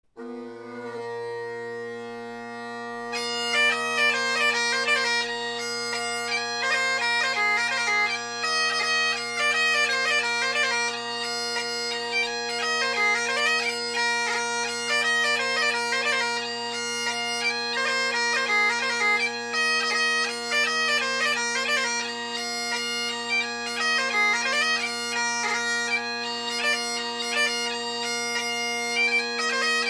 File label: hornpipe; 1950 Henderson drones, X-TREME tenors, Rocket bass, Murray Henderson straight cut reed, Prototype chanter; Clachnacuddin Hornpipe